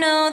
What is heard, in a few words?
vocal